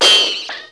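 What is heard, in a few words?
RICOCHET, WEAPONS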